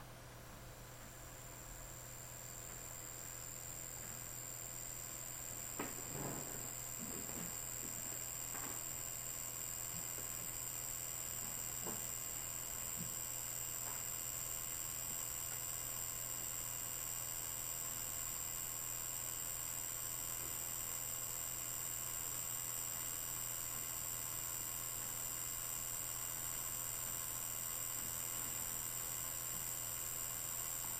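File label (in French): petite cigale des montagnes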